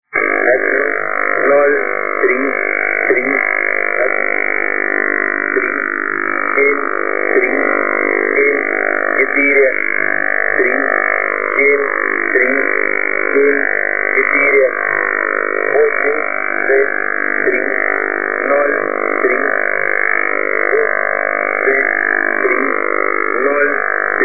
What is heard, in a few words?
jamming